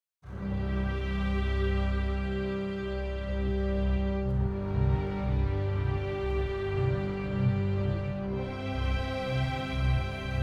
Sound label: Epic